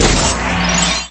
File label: minikit collect sound; lego star wars